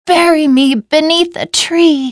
death; windrunner

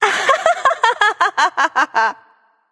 laugh; Mulan

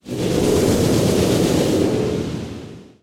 multishot channel; Drow Ranger

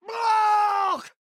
Scream; Lúcio; overwatch

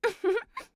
Mage Sorceress Laugh; Realm Royale; Starburst

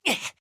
Grunt Attack; Koga; Paladins

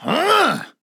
grunt attack

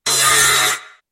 Timbersaw Chakram

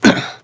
Grunt Jump; Lex; Paladins

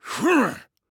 Grunt Jump; Khan; Paladins

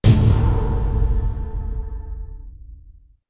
Blink Dagger; Dota 2; Planetfall

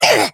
Wound; Battlerite; Stormcaller

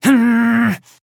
Grunt Attack; Demon

